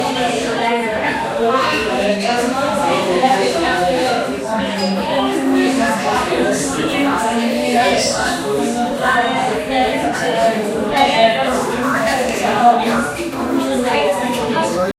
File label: dwarf eating all sloppy i